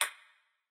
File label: Bullet Bounce